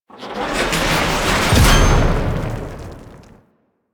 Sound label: Nose Finisher Tear Off Explosion; Warframe; Venus; Orb Heist